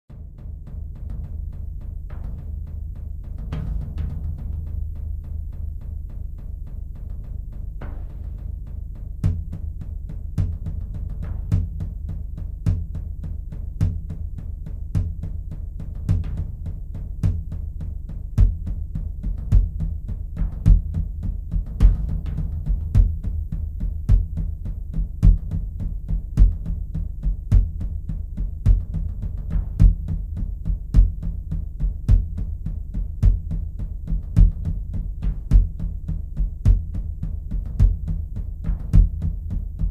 Shamanic Drumming; 32 mins; Meditation; ambient

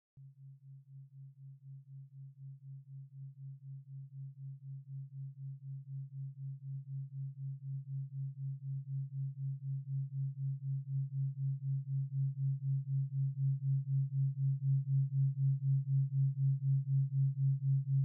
Aham Bramasmi (136.1 Hz), binaural, Meditation; hybrid

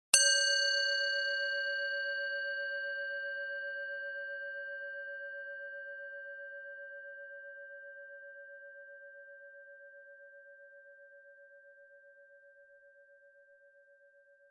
Remote Surf (OM lead); Meditation; guided; 20 Minute Meditations

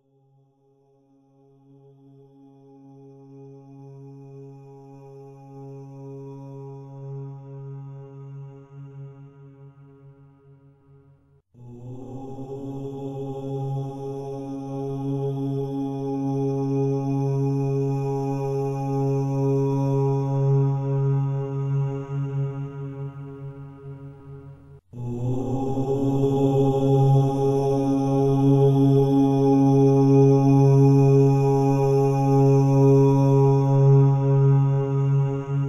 Sitar music for sleep, meditation (OM 136.1Hz); 20m; binaural; hybrid; Binaural Hybrids

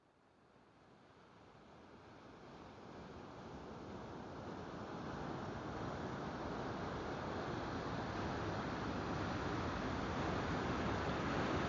Surf Third Eye Hz, binaural; Binaural Hybrids

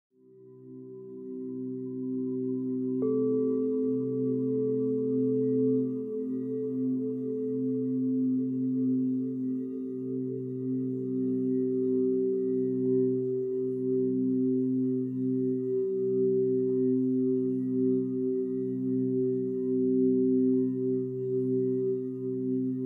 Singing Bowls with OM; Singing Bowls with OM 1; Meditation; ambient

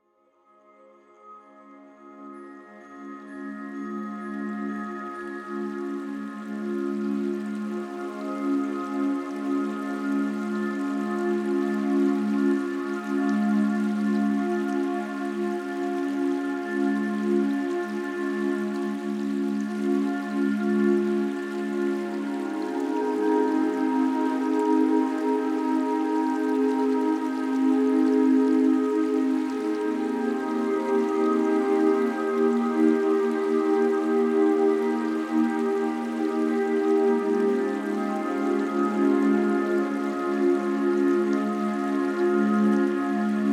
CreativityBoost, binaural; Meditation

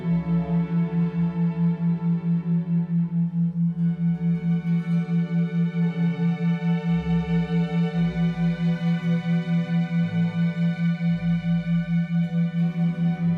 Bach (136Hz), binaural, Meditation; hybrid

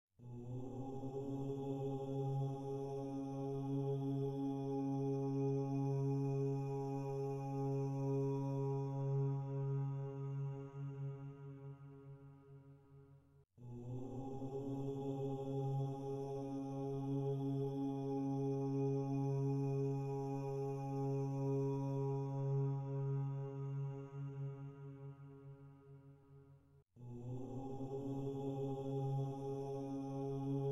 Relaxation (OM lead), binaural; Meditation; hybrid; Binaural Hybrids

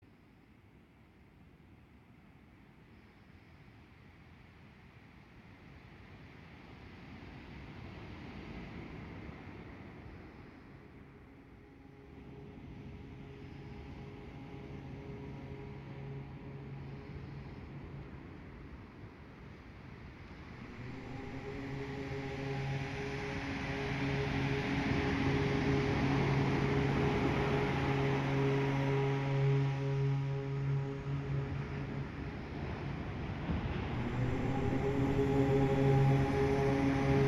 surf 136hz, binaural; Sharp Park surf

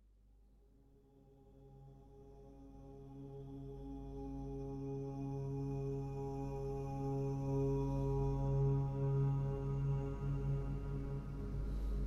OM Mantra w White Noise, binaural; 20m; Meditation; hybrid; Binaural Hybrids

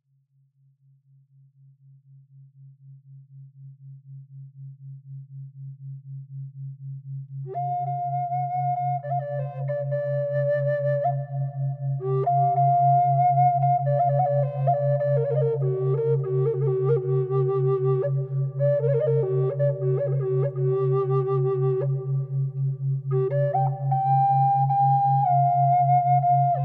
136Hz, binaural, Meditation; hybrid

